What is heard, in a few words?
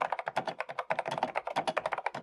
Friend-Typing